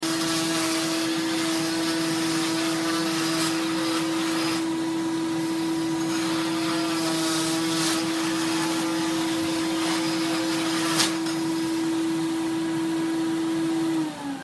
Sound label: cast saw